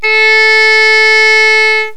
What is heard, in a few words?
oboe 440; Vibrations